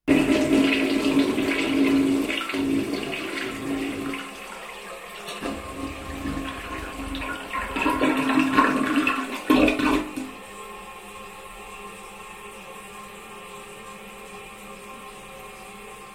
Toilet flush running water

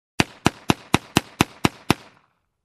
Fast multiple distant gun shots

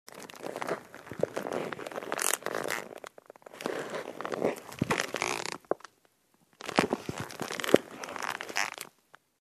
Creaking

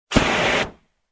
Flamethrower

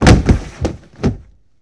Body falls and rolls

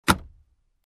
Seat Belt Release

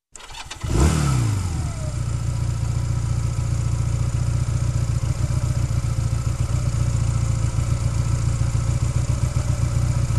Motorbike engine sound running idle

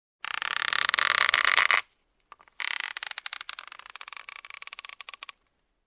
Hanging crackle sound

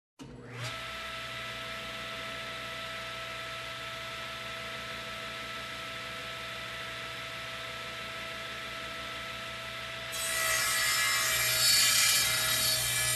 Cutting Machine Cuts Metal

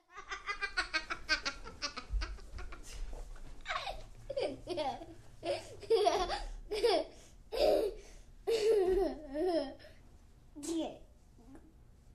Baby Hysterically Laughing

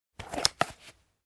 Pulling a gun

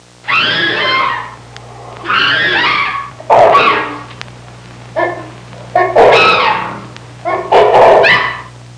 Angry chimpanzee

